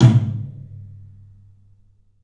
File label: TOM, 3D Drums, CLUB TOM; 3D Sound; CLUB 12 TOM